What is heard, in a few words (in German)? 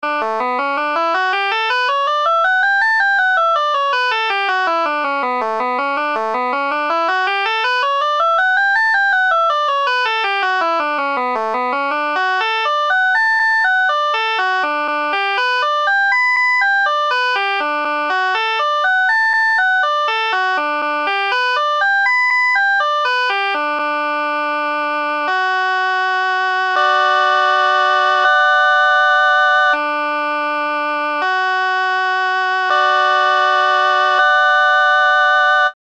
D-dur scale